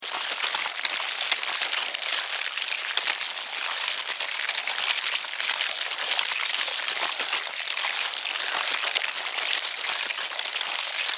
Colony Devour, Animal, Colony, Devour, Carcass, Chew, Bite, Eat, Group, Attack, Insects, Bugs; 5.1; Surround Sound; BlastWave